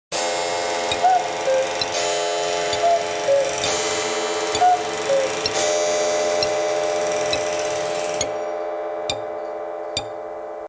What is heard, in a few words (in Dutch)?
Ringtone Koekoek